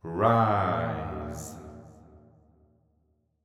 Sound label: deep house male, 125, A, vocals